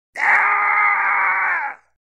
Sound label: Male Death Scream